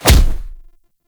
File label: Rush Enemy Hit; Warframe; Runner